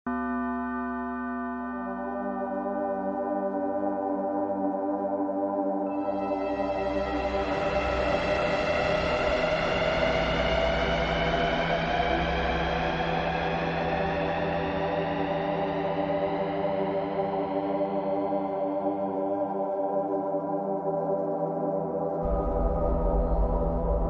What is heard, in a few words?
dark ambient horror soundscape creepy atmospheric